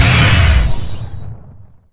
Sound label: cast